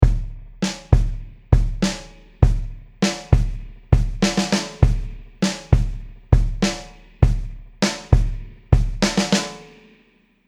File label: drum beats 100 bpm